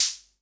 SR95 HH04, Dance Mega Drums; Best Service

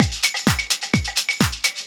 Latin Loop 128 BPM; House; Best Service; House Definition VOL-1

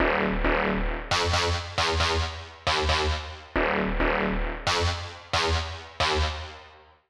Darkstar Lead Riff Eb-F; Grime; 135bpm; Zero-G Sound Sense Grime Scene; Construction Kits; A Positive Vibe

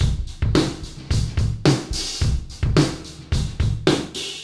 fx 108, Beat Loops; Zero-G; Monster Beats

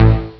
synbaz; ST-XX Amiga Soundtracker Sample Packs

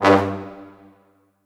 Orchestral Brass

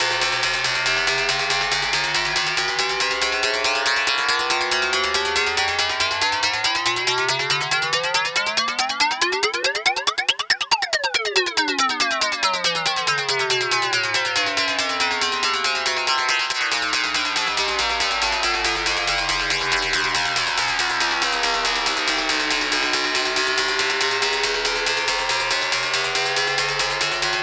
NRG Percussion, Trance Drums; Sonic Foundry (Sony Creative Software); Trance NRG VOL-1

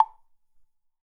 BLOCK; ILIO - Ethno Techno

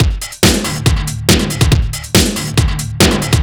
Overdrive, Drums Beats

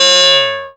fx C#, Rave FX, Dance Synths

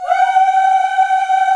VOX, African Aahs; Roland LCDP11 Africa VOL-1

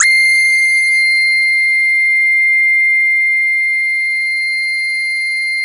JV-1080 RESOTRAIL

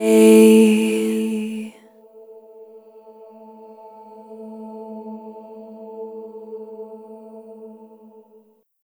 Chord A-E 5th; Sonic Foundry (Sony Creative Software); Pocket Diva World Class Vocal Samples

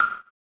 Fx Vox; Equipped Music; Loungin House

